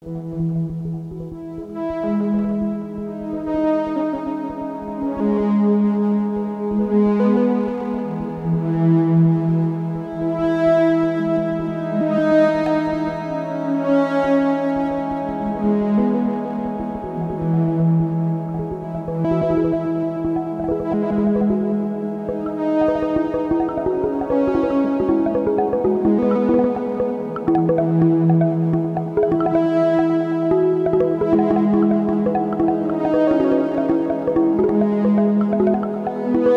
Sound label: neptunian marimba